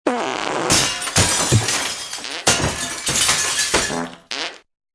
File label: fart fall